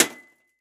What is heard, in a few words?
Impact Aluminum Baseball Bat Hitting VCR